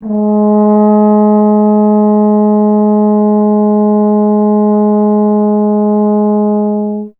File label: BRS TUBA, Solo Instruments